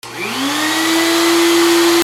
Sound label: GARAGE